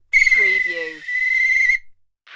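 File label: Referee Whistle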